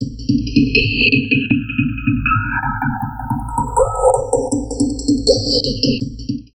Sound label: VOCOD, Drum Loops Crazy Processed